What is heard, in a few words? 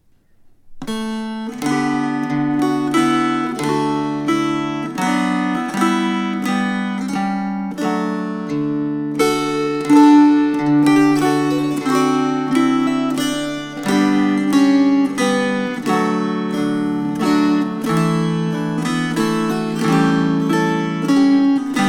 Cradle Song; Christmas Vol II; Away In A Manger